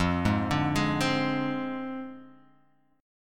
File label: F Minor 6th F down Guitar Half Step Down